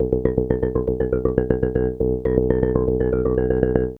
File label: POLYMOD; AMG - Vince Clarke Lucky Bastard